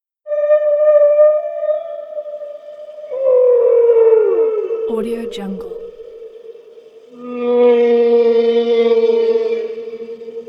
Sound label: whale humpback calls